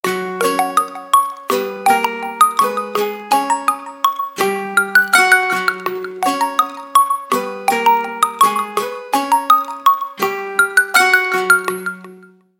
Android notification

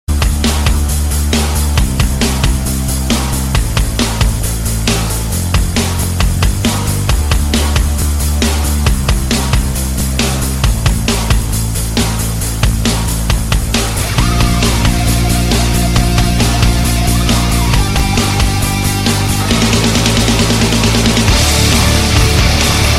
heavy metal

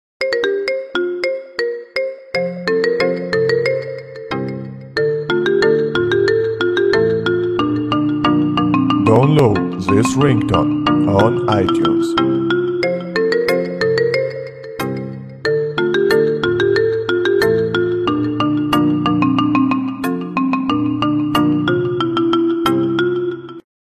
Memories Marimba, Klingelton

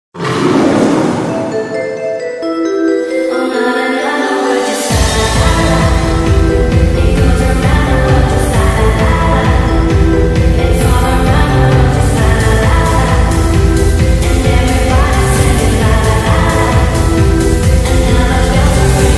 goal song; bayer04 leverkusen

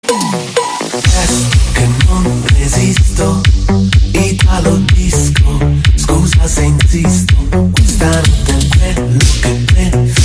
italo disco

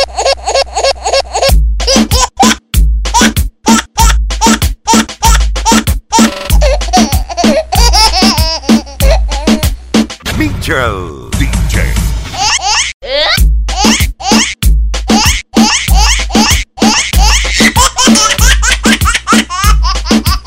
baby rap funny

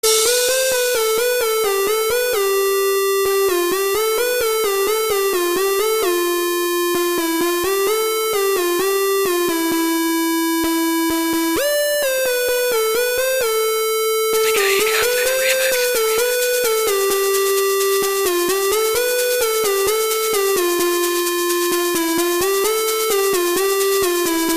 bubbling remix

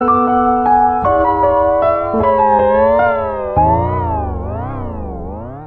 nokia low battery

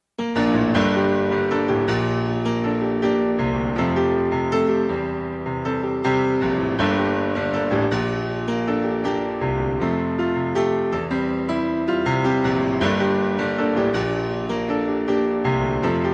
paradise piano

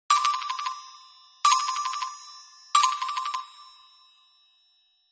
nokia classic; nokia-classic-stack

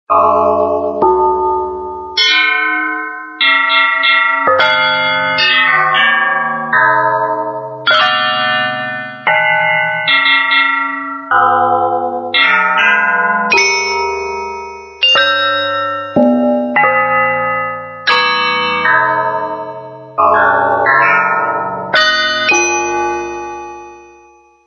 bells sound

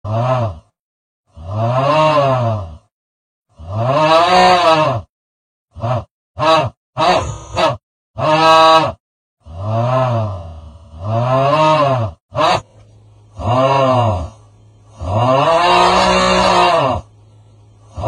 sound effect hooo

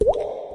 water drop

